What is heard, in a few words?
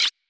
select